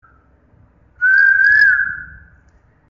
bird notification tone koyal

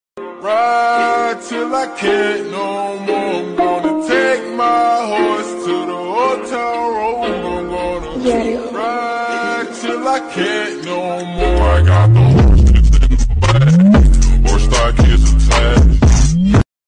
8 bits

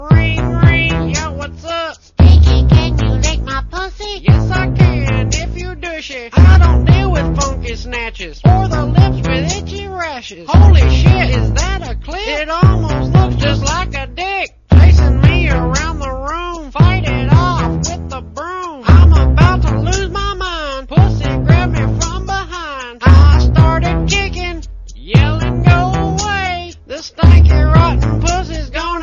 dirtiest fart

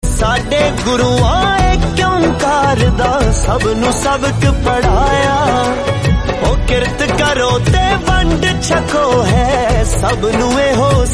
waheguru

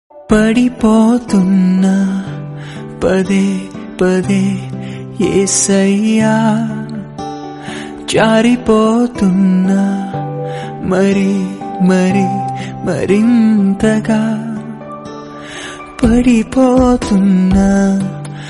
christian, telugu

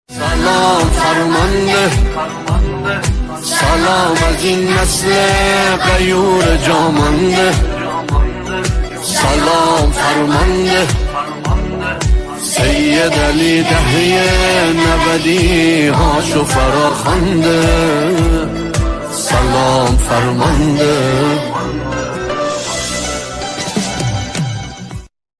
islamic

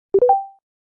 charging sound sms message tone; realme

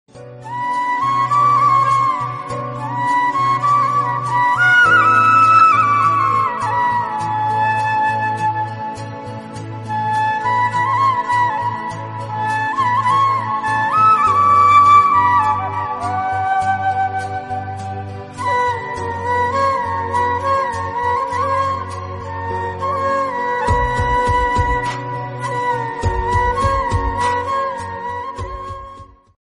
song, flute

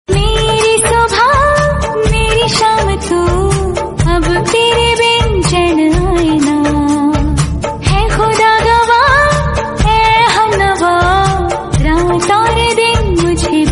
song

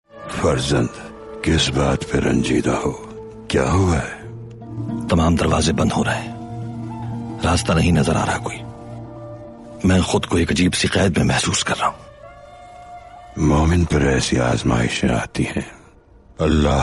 intro music bgm; ertugrul gazi

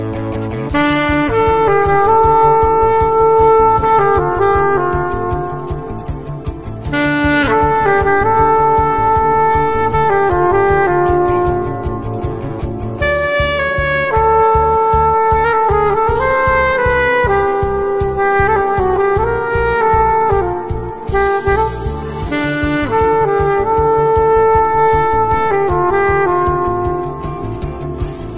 instrumental

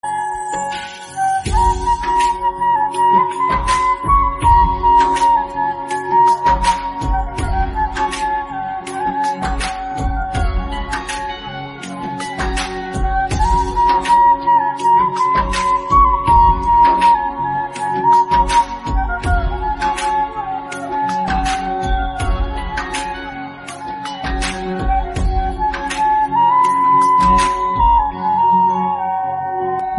flute instrumental

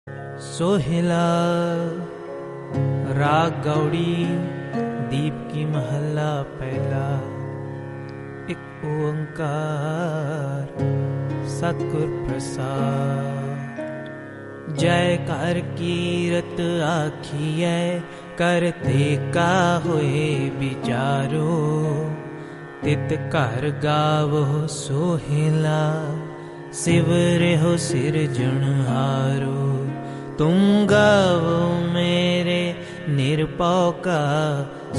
kirtan punjabi; kirtan sohila; sohila sahib

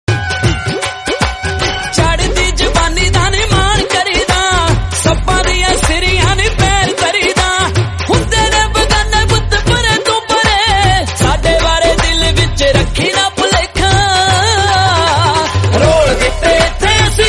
punjabi song

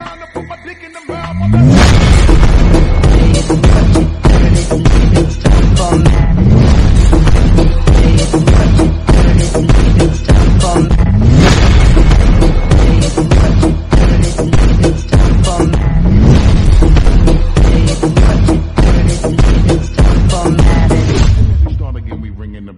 Bass Boosted Car Remix

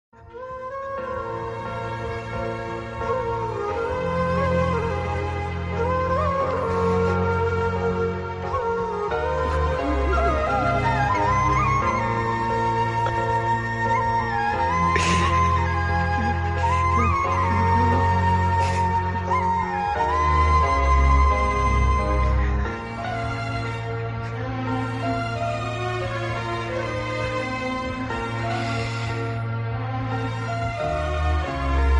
movie bike ride flute bgm instrumental

